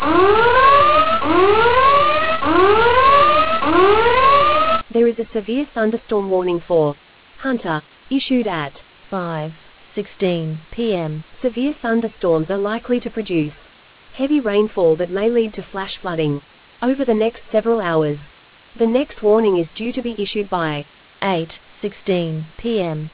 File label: storm audio